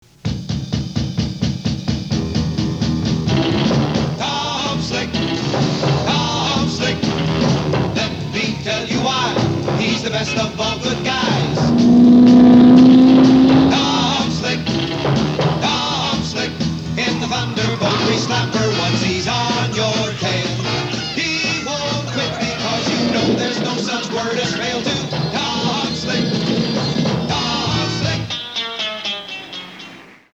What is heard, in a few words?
theme; tom slick; superchicken